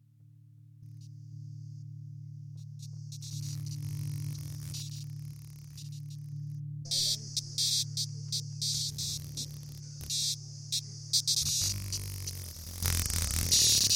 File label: Multispecies Soundscape